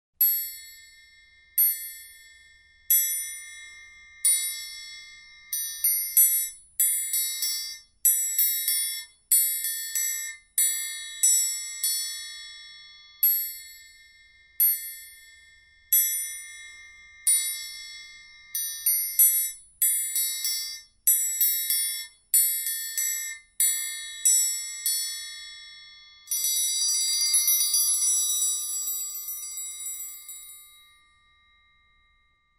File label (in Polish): Trójkąt triangiel; Przedszkole nr 1 Tuchola